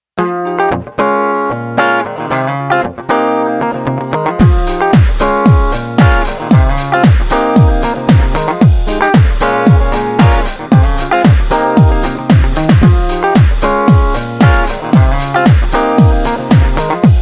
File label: Music Workout; Amway